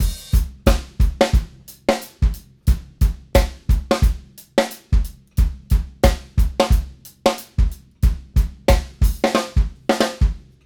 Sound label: Beat